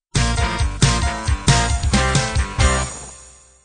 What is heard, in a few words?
rock end